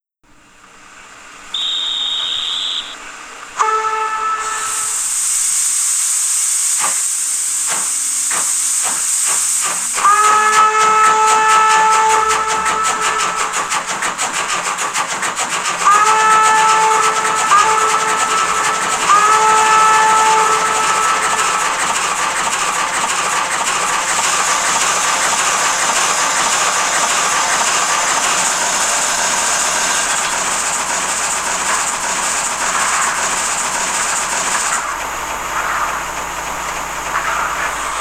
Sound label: BR 23 DRB; DRG